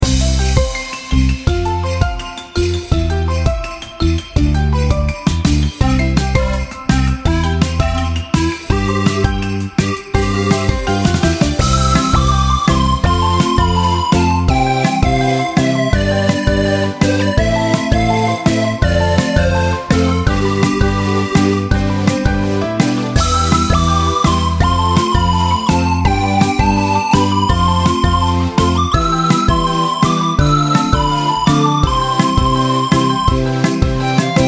magical tundra; christmas

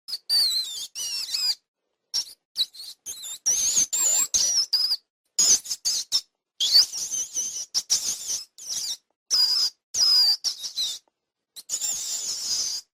kids

